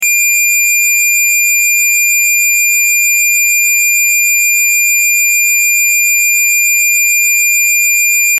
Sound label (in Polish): ton